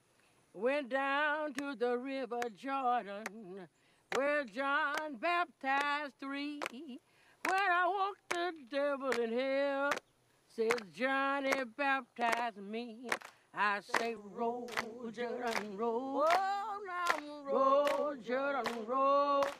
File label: christian songs work songs choir song; roll jordan roll